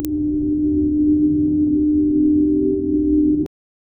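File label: occult energy hum malfunction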